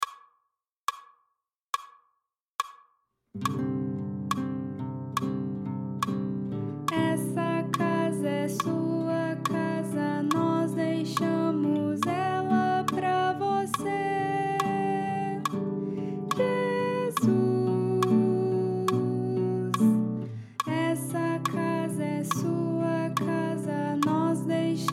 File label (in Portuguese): soprano